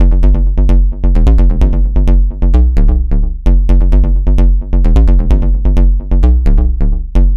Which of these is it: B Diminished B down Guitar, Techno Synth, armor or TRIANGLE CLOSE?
Techno Synth